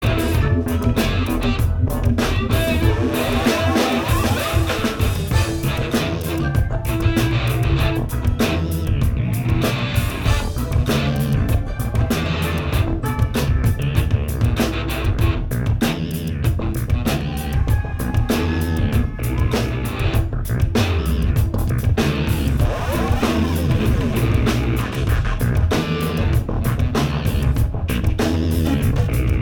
Improv Jam

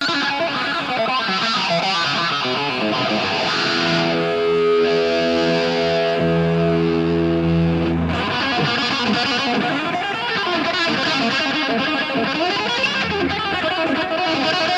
Guitar Solo

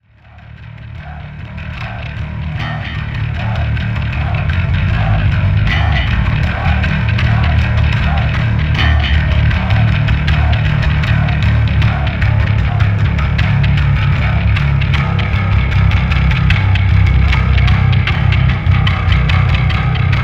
Bass Solo

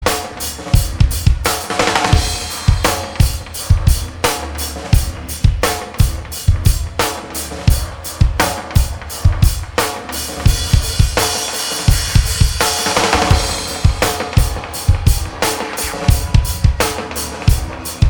Drum Dub

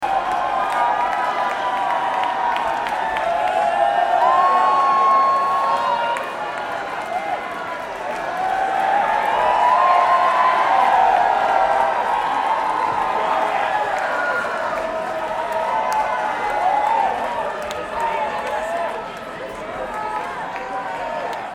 Crowd

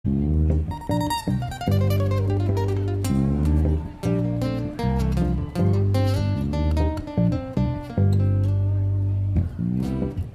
Bass and Guitar Feature

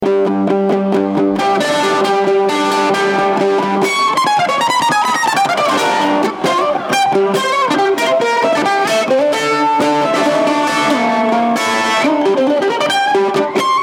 Kentucky Mandolin

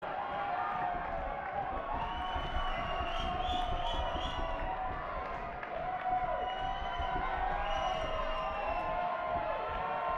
Banter Crowd

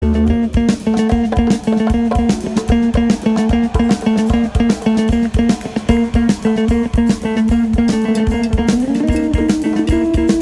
Drums and Bass

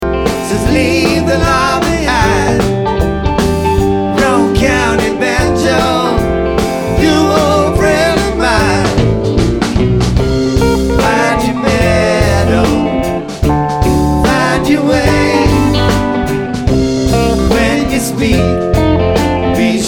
Banjo

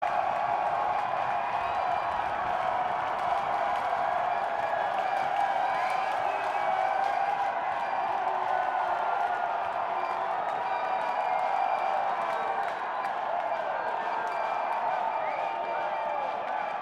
Crowd Banter

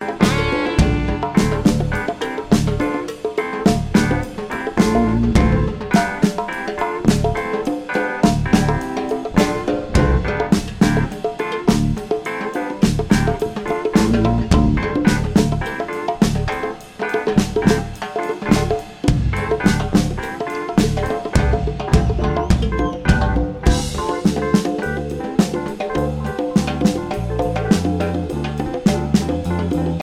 Drums

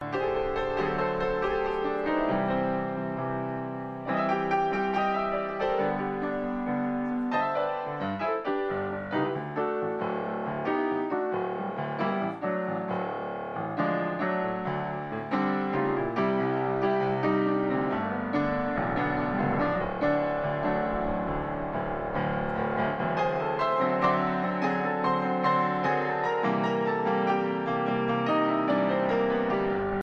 Marco Solo

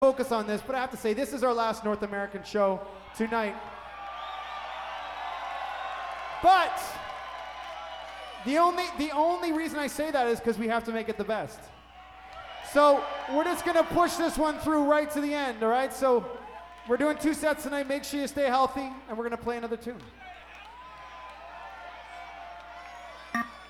Applause

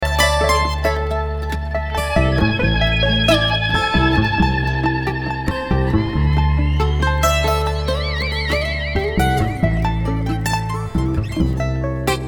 Traffic Jam

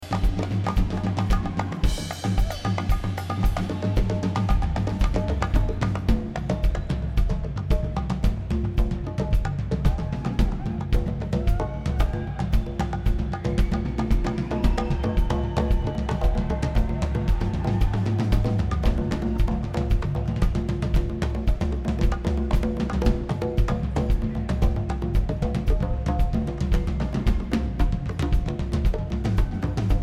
Drums

